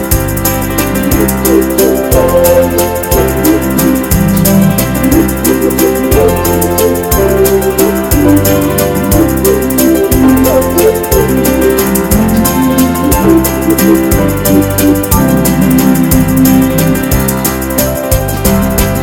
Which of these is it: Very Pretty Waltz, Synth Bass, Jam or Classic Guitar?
Very Pretty Waltz